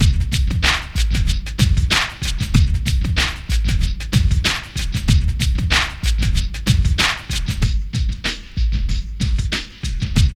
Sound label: PHAT LOOP; E-Lab; X Static Goldmine 2; akai